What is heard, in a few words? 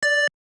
dial tone